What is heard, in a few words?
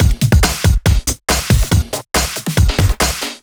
Break Beats, 140 BPM